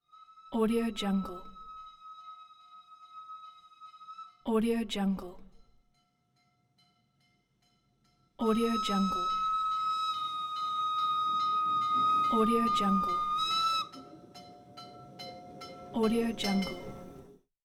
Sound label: train whistle; moonaudioproduction